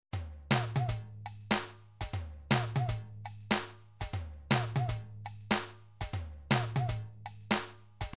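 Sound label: drums pattern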